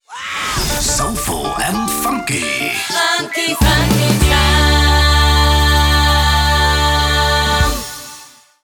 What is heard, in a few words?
Radio Jingle